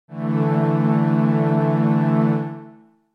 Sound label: D Suspended2